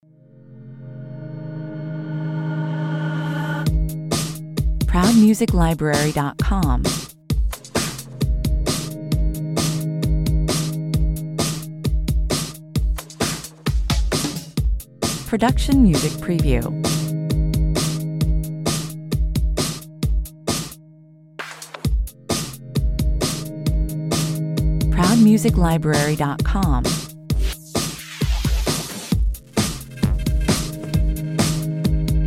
Ghost like sounds and voices drift in and out between the prominent drum track, 132 bpm